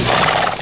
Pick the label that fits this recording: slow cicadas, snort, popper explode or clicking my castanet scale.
snort